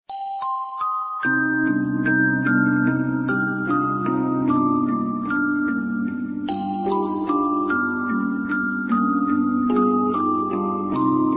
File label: the music box